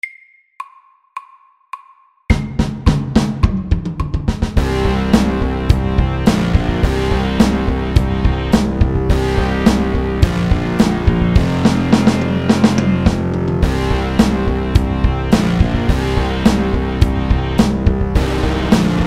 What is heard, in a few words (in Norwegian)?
Heavy metal MIDDELS